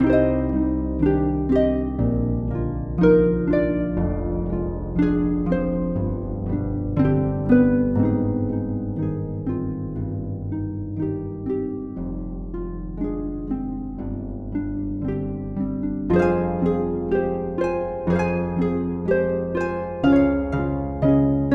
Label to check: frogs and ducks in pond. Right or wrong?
Wrong